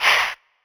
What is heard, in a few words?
Chant; SSO Chants